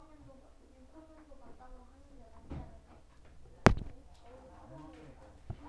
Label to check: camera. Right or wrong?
Right